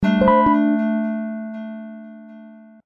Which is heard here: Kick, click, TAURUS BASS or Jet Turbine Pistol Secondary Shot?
click